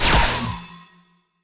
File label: light bolt